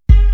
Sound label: BOOM